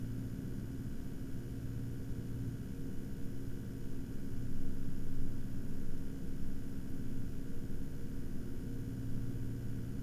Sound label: 1000 RPM